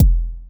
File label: thumping industrial techno kick drum one shot